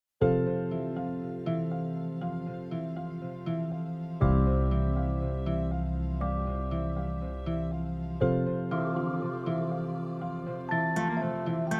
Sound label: Karaoke, 120 BPM; BasserMusic Studios